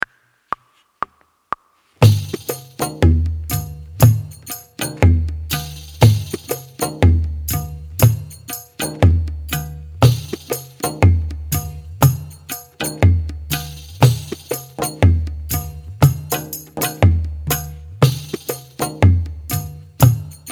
Gi practice with Ge with tabla

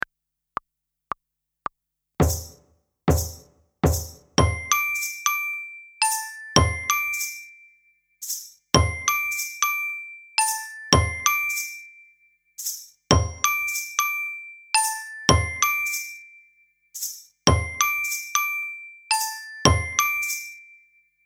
Group Piece without tabla

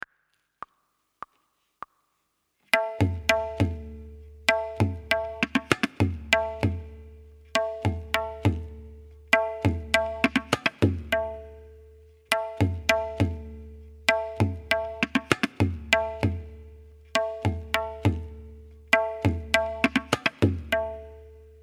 Tabla Solo

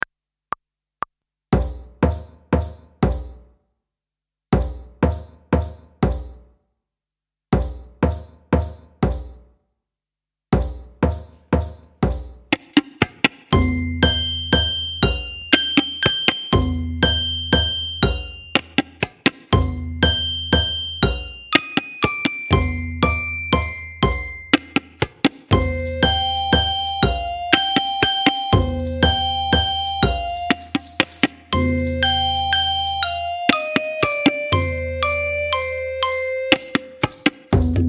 Group Piece with tabla

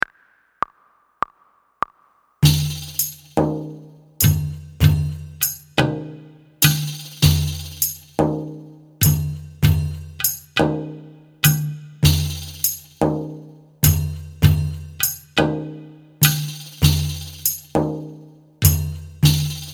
dotted rhythm slide practice without tabla

